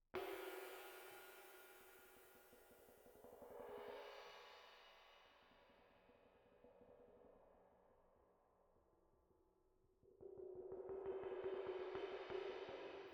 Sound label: OH